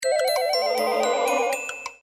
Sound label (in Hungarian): effekt work